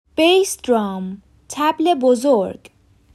Bass drum

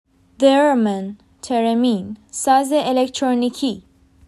theremin

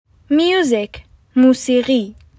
Music

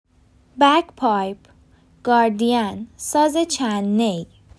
Bagpipe

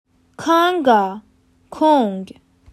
Conga

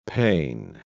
pain

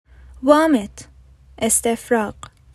Vomit

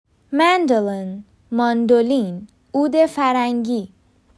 Mandolin

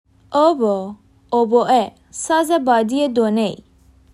Oboe

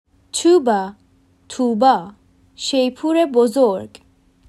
Tuba

